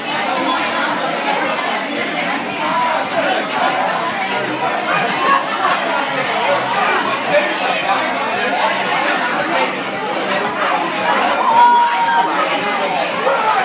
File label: Ambience Bar Restaurant Friday Night Mariachis; Gringos Y Mariachis